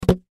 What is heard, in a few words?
Tupperware, Tupperware Set Down; Foley; Kitchen; Household; Blastwave